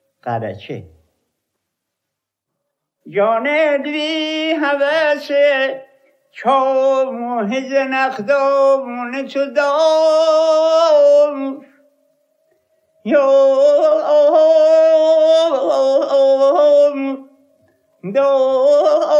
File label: gharacheh, rast panjghah